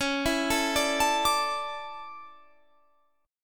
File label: A Suspended 4th, C sharp